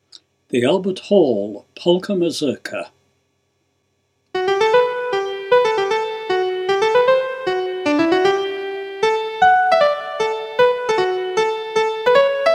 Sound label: Polka Mazurka, Fiddlers; Albert Hall